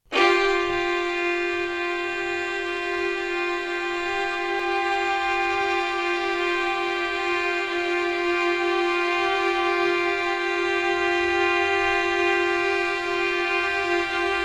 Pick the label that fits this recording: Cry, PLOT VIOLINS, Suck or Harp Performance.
PLOT VIOLINS